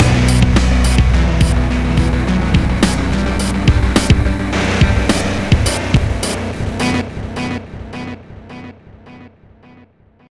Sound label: Mission Music Stinger Intro; starcraft 2 wings of liberty